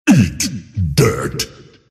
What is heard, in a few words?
attack pain; earthshaker; arcana